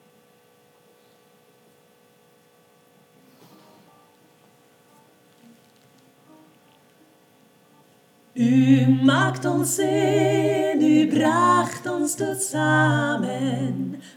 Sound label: tutti soprano